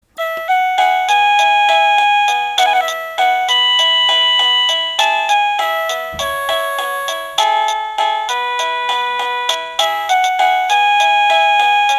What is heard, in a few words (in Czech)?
melodie